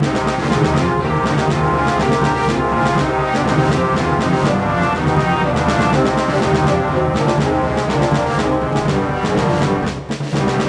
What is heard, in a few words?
Duel Intro Music